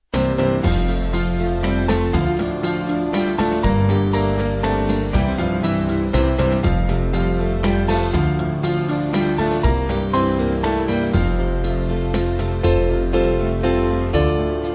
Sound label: Band; Alleluia Alleluia Give; Fishel